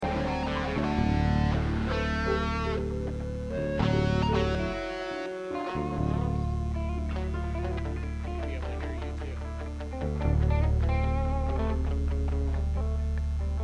Buzz and Feedback Mix

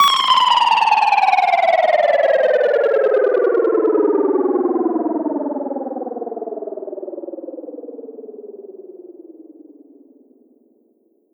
Perc FX Alien Landing, 909; Slate; Vintage Drum Machines Volume 3 909 Edition

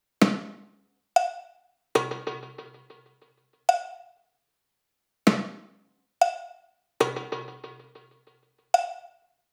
perc loop 95, Pop, Drums; Loops; Slate; SD PASADENA Pop Sample Pack

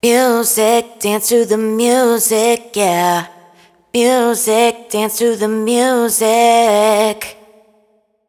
hook 123 F wet, Vocal; Slate Digital Omnivox Vocal Sample Pack

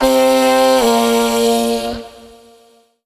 phrase Bb, Vocal

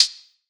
hat ambi, Closed Hat; Hip-Hop; Drum One Shots; SD CAICOS Hip-Hop Sample Pack; Slate

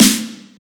snare bright; Drum One Shots; SD MIDNIGHT Sample Pack; Slate

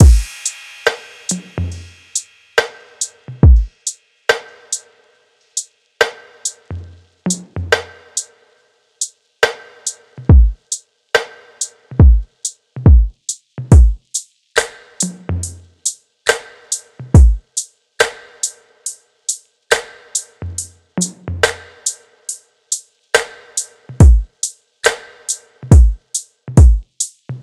drums 70, Full Drum Loops; Slate; SEISMIC Drum Sample Pack

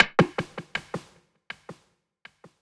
percussion oneshot paris; Pop; Drums; SD PASADENA Pop Sample Pack; Slate

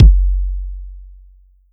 Kick, 909 Kicks; Slate; Vintage Drum Machines Volume 3 909 Edition

